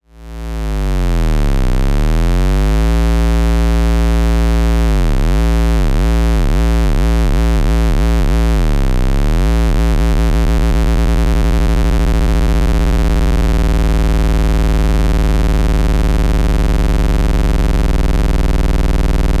Erica Synths Liquid Sky Dada Noise System 2 Clock Oszillator